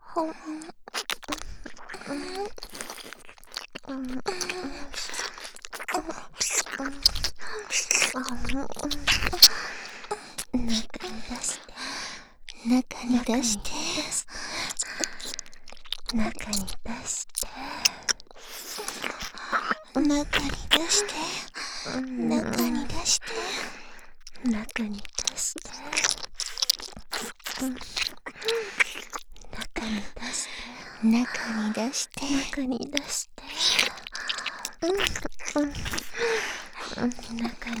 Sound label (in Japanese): 耳舐め; ハーレムオナサポ 4人のメイドがご主人様のオナニーをお手伝いするバイノーラル～文、千曜、名月、莉桜～